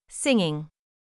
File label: singing; 英語「M」「N」「NG」の発音のコツ